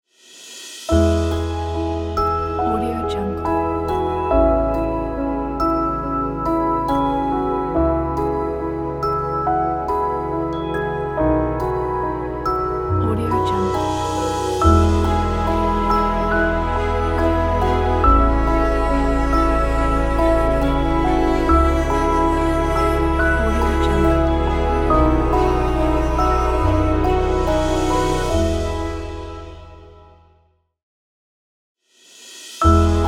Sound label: emotional romantic wedding logo